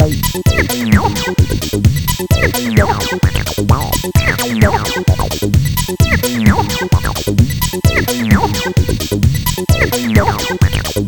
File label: 80s Style, ACID Presets; 100 acid vol 1